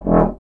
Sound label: tuba; Miroslav Vitous - Solo Instruments VOL-2